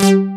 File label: DBL BASS; Pro-Rec - Synthsonic; BASSES